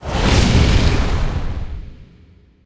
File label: fire flame; plague toilet; godzillark